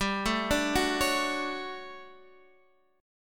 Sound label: D Major 7th, G; DM7/G Chord {X 10 7 7 7 9} arpeggiated